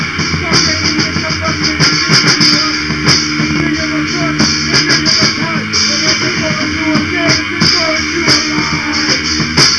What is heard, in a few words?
hiphop